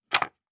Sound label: Wood Debris; Fantasy Sounds Bundle; Craft